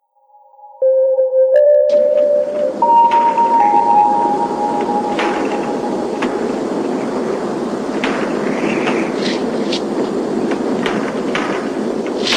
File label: Ghost Wind